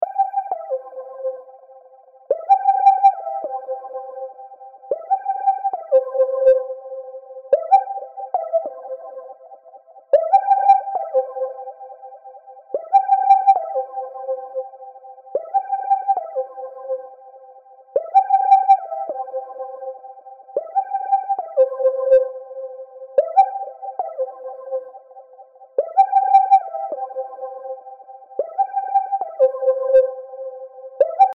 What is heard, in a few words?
synth lead breakdown